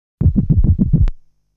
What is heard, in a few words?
Sound that the door opens; legend of hero tonma